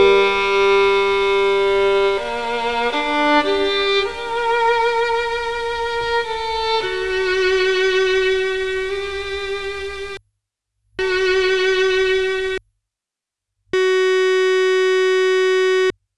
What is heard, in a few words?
real sound and a selected single waveform repeated; Bruch